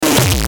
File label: Distortion Descend Buzz; Distortion Descend Fast Buzz; Descending; Blastwave